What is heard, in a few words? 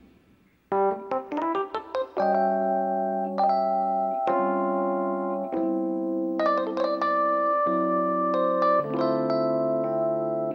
Groove Solo, orgues Hammond et cabines Leslies; pseudo-Rhodes (en réalité un synthé Korg); extrait d'un concert récent; ce n'est pas de l'orgue, cette fois-ci; C'est un pseudo-Rhodes (en réalité un synthé Korg), et extrait d'un concert récent